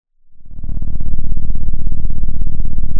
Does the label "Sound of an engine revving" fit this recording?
Yes